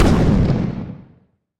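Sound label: explosion of a failed experiment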